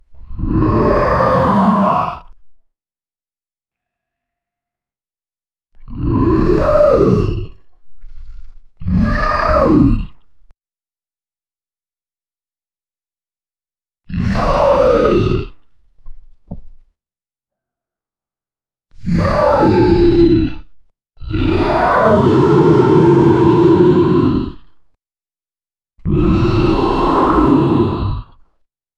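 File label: kaiju dinosaur; godzilla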